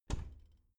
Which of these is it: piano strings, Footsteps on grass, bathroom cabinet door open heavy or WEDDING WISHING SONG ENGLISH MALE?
bathroom cabinet door open heavy